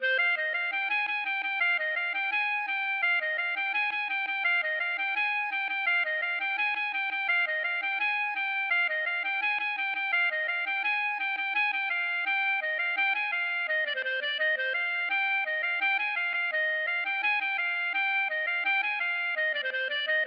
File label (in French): gavotte; Gavotte du Bas Léon de Lannilis